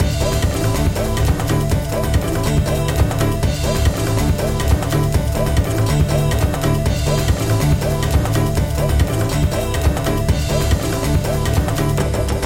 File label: summer battle normal boss